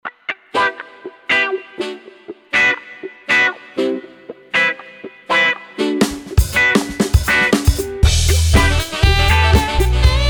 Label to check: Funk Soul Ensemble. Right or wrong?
Right